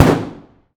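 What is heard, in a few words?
lmg sup urb int close rear player; atmo; gmod; viper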